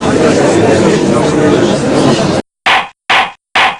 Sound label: gallery triple gavel